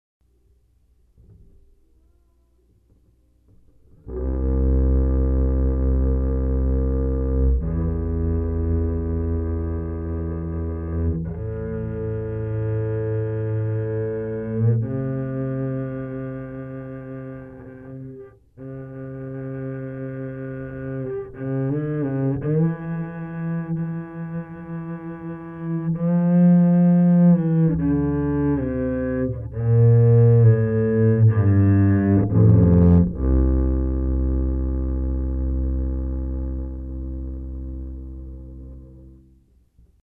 bass trial two arco

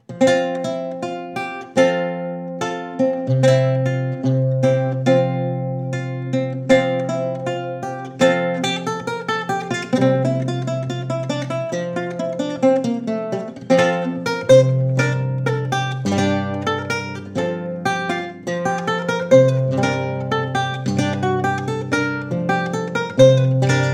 Lute Branle; Fowerth

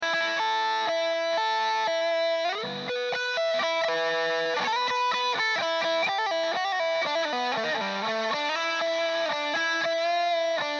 A120 Fuzz Solo

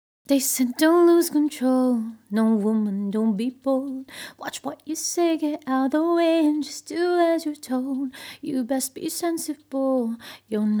Vox Verse Layer Dry

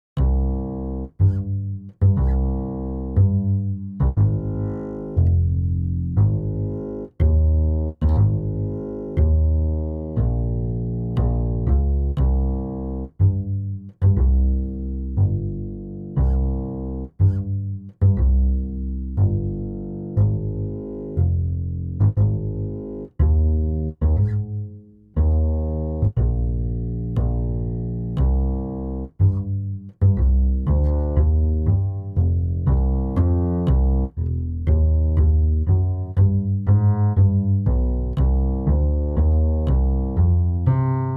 Bass

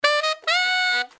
brass loop

